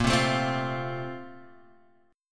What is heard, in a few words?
Bb Major 7th Suspended 2nd, Guitar, Open G